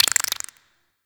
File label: Infested Burst Rifle Mech, weapons